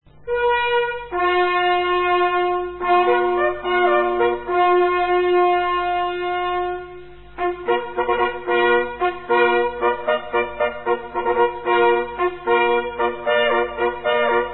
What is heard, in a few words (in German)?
begruessung, signale